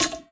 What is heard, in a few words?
vent step; headcrab classic, scripted; creatures